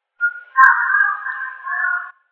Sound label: voice, bigfoot